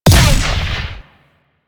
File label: Ice Sniper Fire